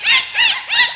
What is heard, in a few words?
DOLPHIN; animal fun